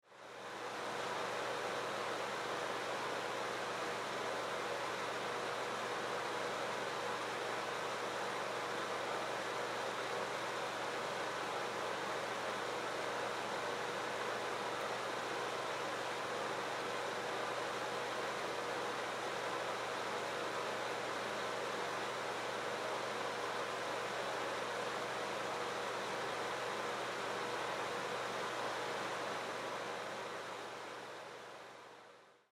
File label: Household Bathroom Fan; SFX Bible 6